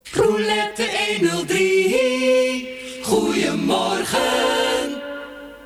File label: silence